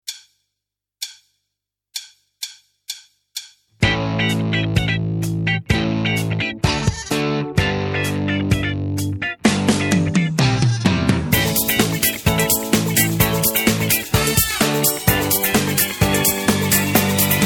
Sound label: NO BASS